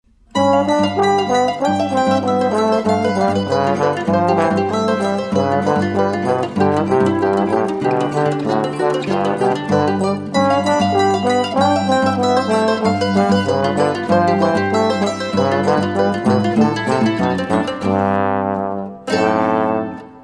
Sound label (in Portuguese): batuque